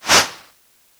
Arrow Air; SteamVR; InteractionSystem

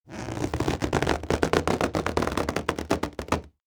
Balloon Rubber; SteamVR; InteractionSystem

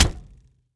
Arrow Hit; SteamVR; InteractionSystem; Longbow

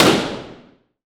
Balloon Pop; SteamVR; InteractionSystem

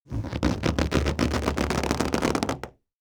Balloon Rubber; SteamVR; InteractionSystem

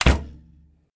Arrow Release, Longbow; SteamVR; InteractionSystem

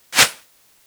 Arrow Air; SteamVR; InteractionSystem

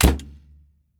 Arrow Release; SteamVR; InteractionSystem; Longbow

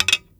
Arrow Nock, Longbow; SteamVR; InteractionSystem

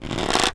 Longbow Pull Back; SteamVR; InteractionSystem

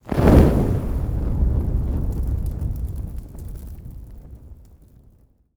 Arrow Launch Fire; SteamVR; InteractionSystem; Longbow

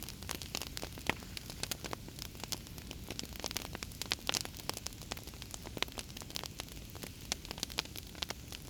Torch Fire Loop; SteamVR; InteractionSystem

